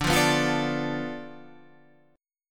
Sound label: D Minor 6th